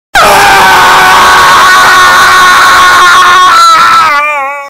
urlo terrificante, scream

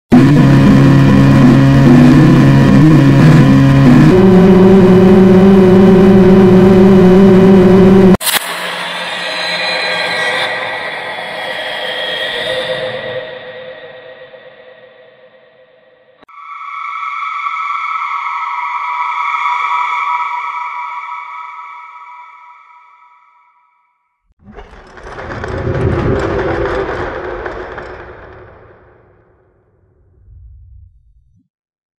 suoni paurosi effetto sonoro scary; bhoot shorts

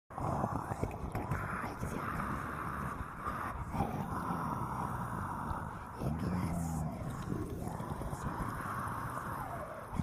nuovi sussurri horror effetti sonori horror inquietanti; original sound effect

